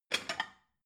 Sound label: plate cupboard take